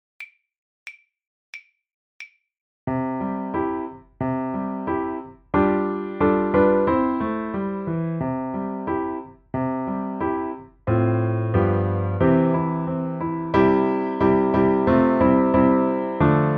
Far Far Away accomp, violin; The Violin Fun Book (Level 2)

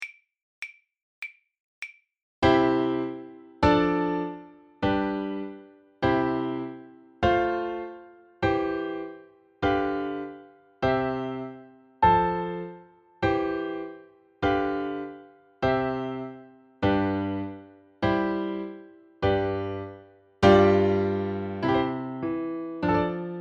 Etude accomp, level2violin; The Violin Fun Book (Level 2)

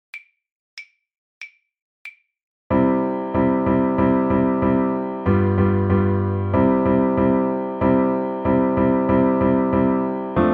accomp, violin; The Violin Fun Book (Level 2); Mulberry Bush

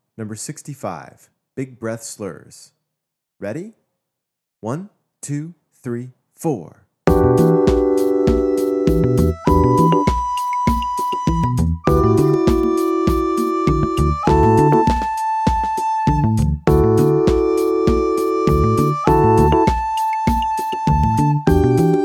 Big Breath Slurs

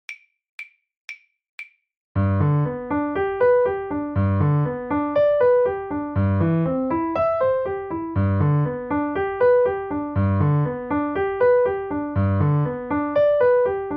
accomp, violin; The Violin Fun Book (Level 2); Water-Water Wild Flower

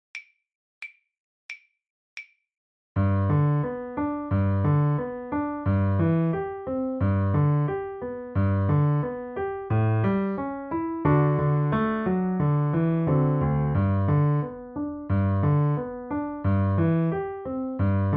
accomp; The Violin Fun Book (Level 2); My Old Kentucky Home

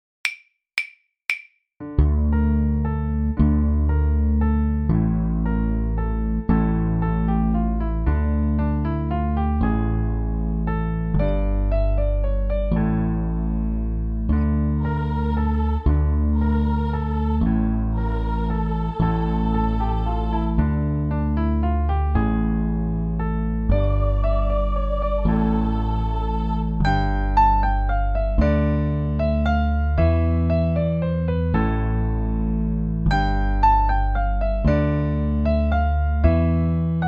Waltz

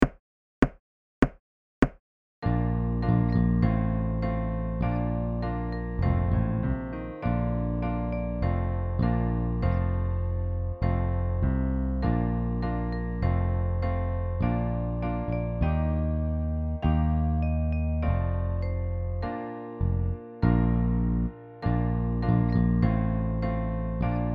Country Hoe Down

